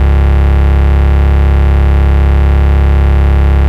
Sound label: Ultimate Bass Root C#; Vengeance Samples